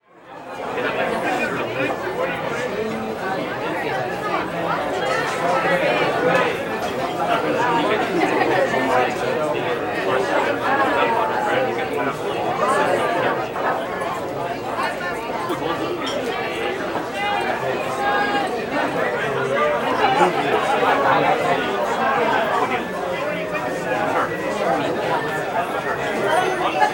Bar Pub Busy Bar, Walla; Sound Ideas; Walla Series

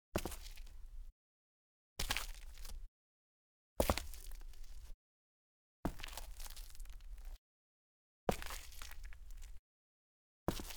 Cement Gritty Sneakers, Foley Footsteps; Sound Ideas

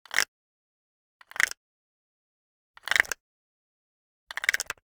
Generic Click Wood Small; boom library; The Interface

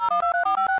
OLD CALL INIT; skype

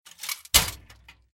Loose Soil; Blind Quest - The Frost Demon

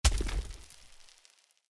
Walls; Blind Quest - The Frost Demon

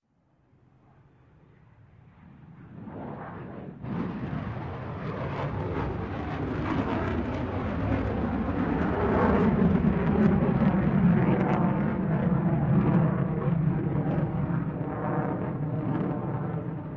SR71A Blackbird Pass Overhead; Sound Ideas; Series 2000

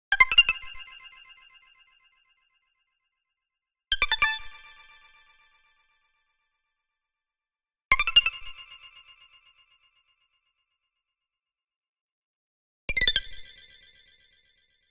Arcade Jingle Neutral

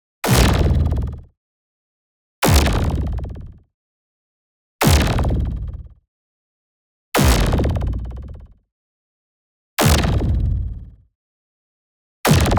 IMPACT LARGE Simple Synth Hits; boom library; Matter

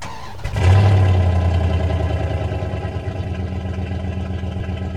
Truck Durango Start Loop; Trucks

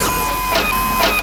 Gun Reload Scifi, Weapons Foley; SFX Kit

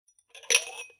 Glass

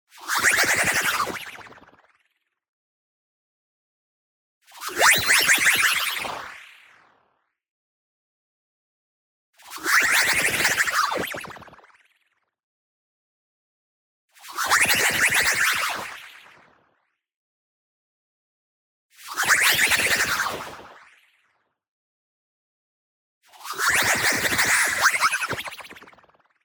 movement fast, white noise shift filter resonance; boom library; Anime Essentials

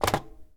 Phone Pickup; SFX Kit; Foley - Misc

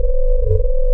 Scifi Hover Sentry Loop; SFX Kit; Sci-Fi Loops

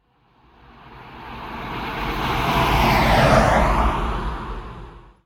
truck; Blind Drive

